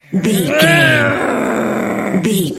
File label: voice female effort growl